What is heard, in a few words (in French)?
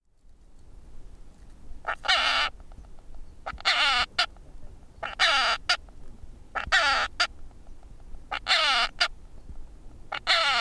oceanite tempete